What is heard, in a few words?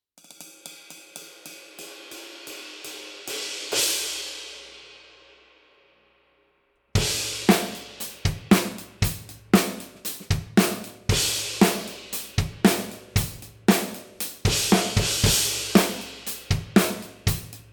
Extra Dry Thin Crash